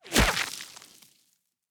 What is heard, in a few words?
Bullet Impact Dirt; Bullet Bys & Impacts